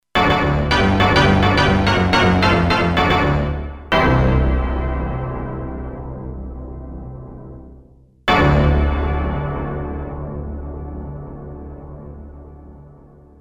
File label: Organ Hit